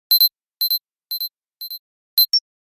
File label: nfc transfer complete, nothing phone